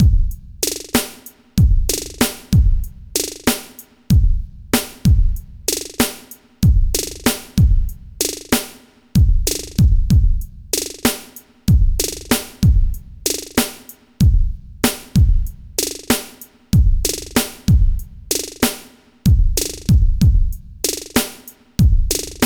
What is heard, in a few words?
TECHNO, Funky Xtreams; INIS